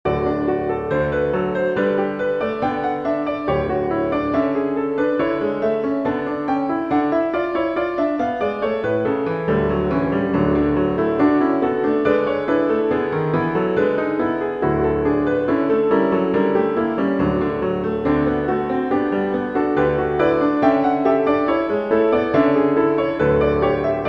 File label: Piano Only; Brahms mvt